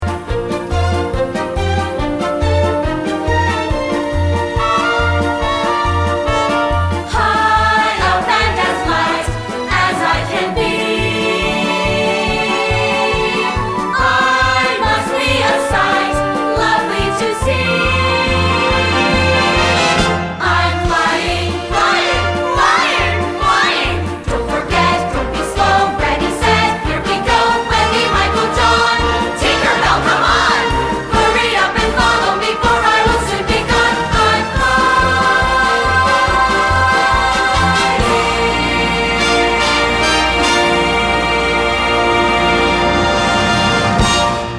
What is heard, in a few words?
audition cut with vocals